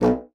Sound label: B1, bassoon; Xsample VOL-6; C BASSOON